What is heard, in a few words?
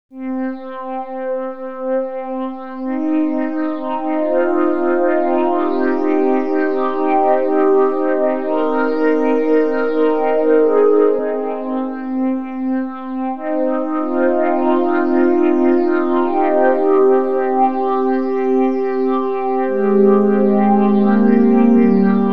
Light Pads, Electro Lounge; Sonic Foundry (Sony Creative Software); 086 Huggybear